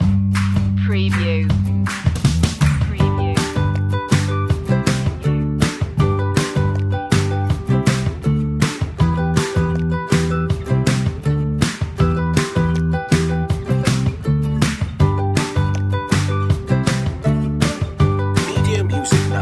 light upbeat

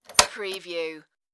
apartment door lock

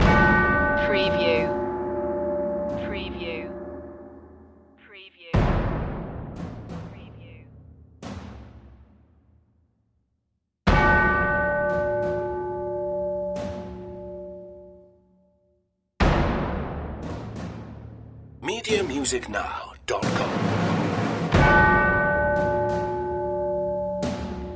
stormbloods percussion only

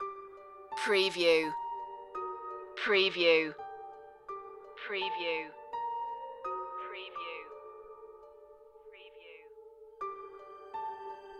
choral

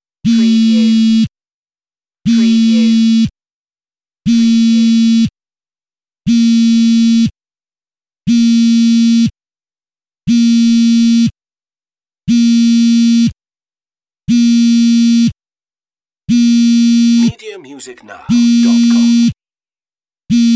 phone vibration on fabric

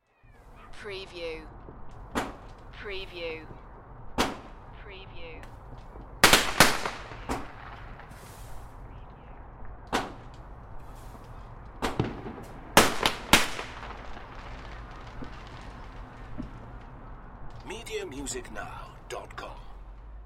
Fireworks Bangers; beanstalk audio

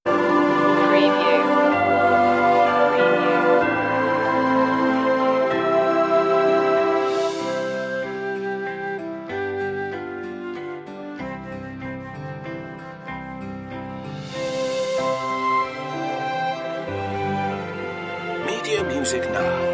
orchestral; silent night; media music now

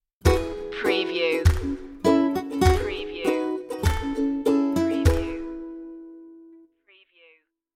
country thing stinger

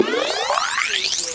CARTOON CHANGE

